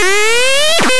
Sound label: machine